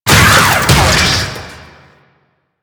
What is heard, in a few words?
Necramech Ranged Knock Down; Enemies; Warframe